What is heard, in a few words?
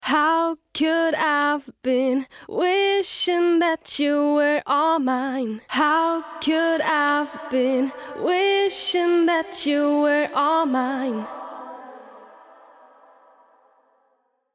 final mix; Hall bei Gesang